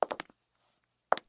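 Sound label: double calm measured step on heels on parquet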